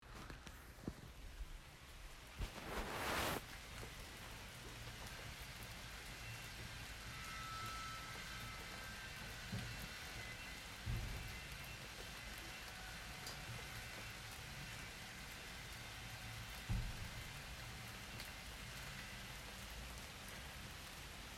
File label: soft summer rain parakeets and a squirrel jumping on the bird feeder